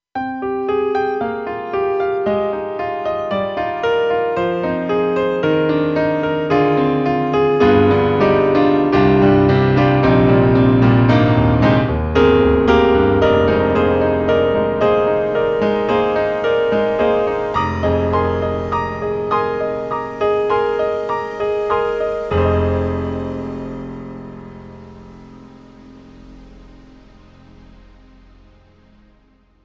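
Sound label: KORG M3 XPanded Combination, piano; Lake of Two Mountains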